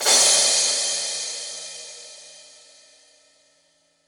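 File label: crash cymbal PLATE; pcm60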